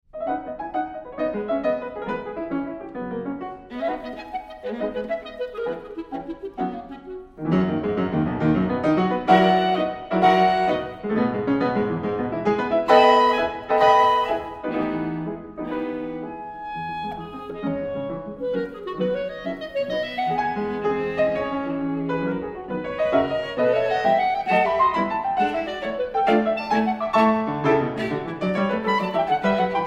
Clarinet, Viola & Piano, Allegro vivace, ma non troppo